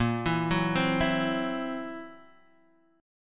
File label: Fsharp 7th Flat 5th, guitar chord